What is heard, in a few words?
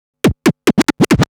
Scratch Fills 140BPM